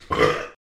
manly burp

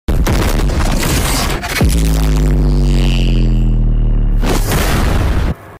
boom sound; bass boosted; fire force

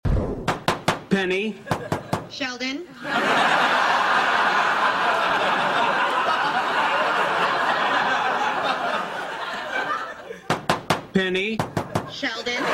knock knock; Penny

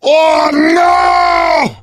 heavy negative vocalization

